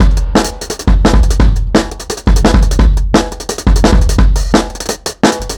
Bon Bon Kit 4 Bar, Drum n Bass Beats; Beats and Percussion; KJ Sawka; Drum n Bass & Beyond

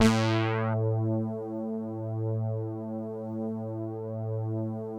Deep Bass a2, Korg EX-800

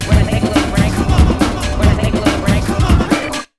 RUN 140, Big Beat Drumloops; USB Soundscan vol.25; AKAI

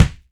KICK, MG KICK

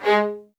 MED ATT G#, soft violins; Q Up Arts; Denny Jaeger Master Violin Library

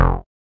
BAS F1, Yamaha CS40M synth bass

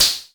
noise fx; Masterbits; Switch FX

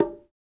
Congas, African Contemporary

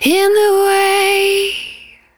110bpm Ab, Velvet Vocal; Zero-G

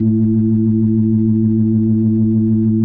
A2F, B3

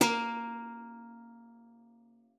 A#3, guitar; Xsample VOL-2

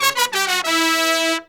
96BPM, Horns; Phantom Horns; 90 s Sample CDs; Zero-G

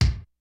kicks snare, kid; Kid Nepro; Elite; KICKS SNARES

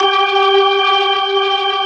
G4, Keyboards Old School; USB Soundscan vol.09; AKAI; BIG HAMM

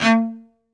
SOLO CE, Strings; ILIO

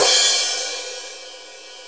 Crash, Drum Machines

